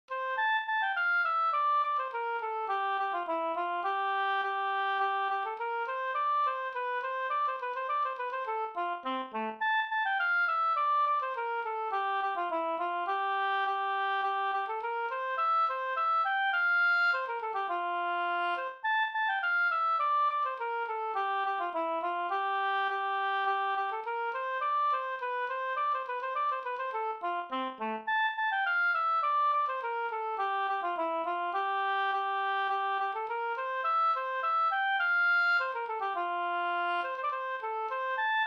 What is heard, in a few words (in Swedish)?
snoa